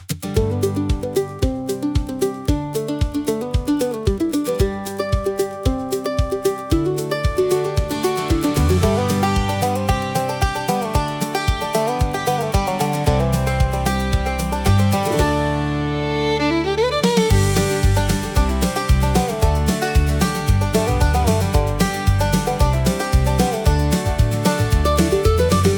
Wide Open Morning, country acoustic for nature and refreshing